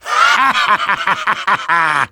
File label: Demoman laugh evil